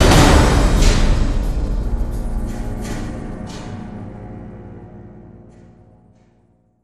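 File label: stinger drum hit; transformers war for cybertron gamerip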